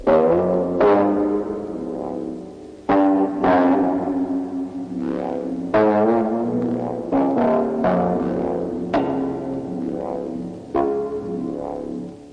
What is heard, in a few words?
ominous wobble surf guitar; GUITARS